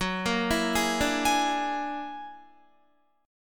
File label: Gb Augmented 9th Gb down Guitar 4F,4A,5C,5G,5C,6E; Listen to Gb+9 arpeggiated